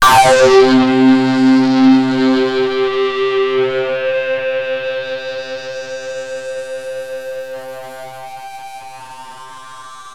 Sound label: Access Virus B; Synthworx